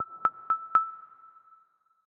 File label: wireless charging started; LG; Rollable-LM-R910N; Unique